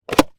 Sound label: car cigarette ashtray close; transortation